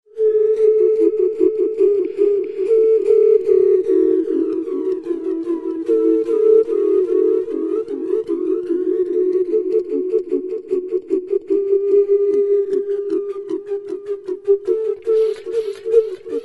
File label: singing doves